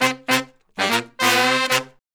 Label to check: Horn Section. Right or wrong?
Right